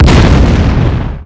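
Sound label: big explosion; PC Gamer 37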